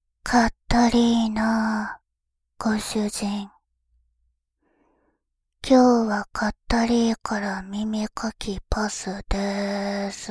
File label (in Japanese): メイドさんの耳かき, ASMR